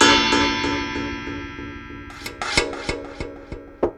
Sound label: Delayed, Wire & Tin, contact mic; musicradar; contact mic madness